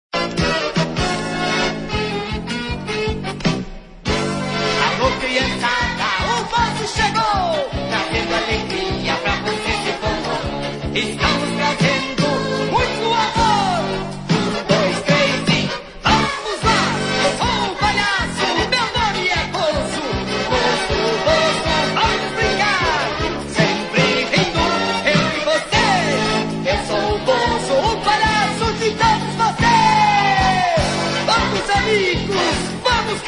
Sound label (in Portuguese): Vinheta; Bozo Chegou